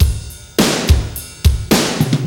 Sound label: drum loop; awe32